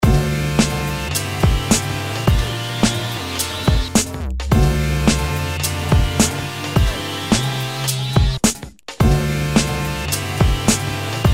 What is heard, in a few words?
New Beat LOOP 107 bpm; Lino Casu